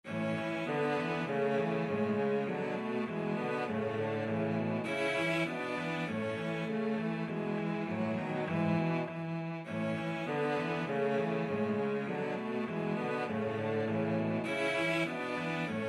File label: melody, cello trio; schumann